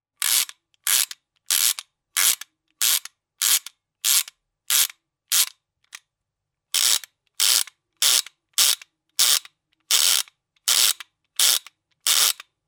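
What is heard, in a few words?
ratchet sound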